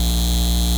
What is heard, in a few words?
neon; lasvegas